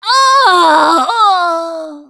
Death Scream

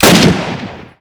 m1 garand Shoot; Minecraft; Infamy; Last Alive Pack

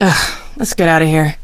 ugh; Garry's Mod; hl2; eli lab

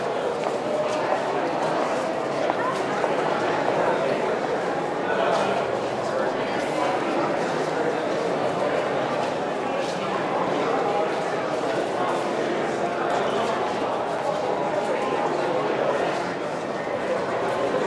Crowd Indoor; GrandFantasia

